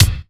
BD GATE, DRUMS; RY30DRUMS; East West; Global Sounds

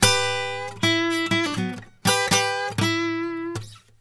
Blues Guitar; ILIO; Fretworks; LICK

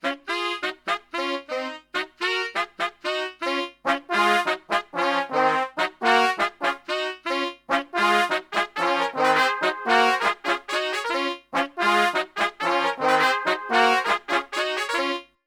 BRASIL, Brass Super Section, BRASIL LICK; Best Service

